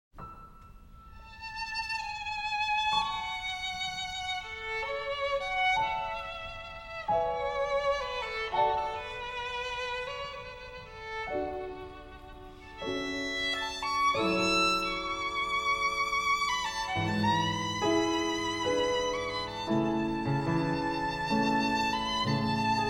Triple Concerto for Violin, Cello, Piano, and Orchestra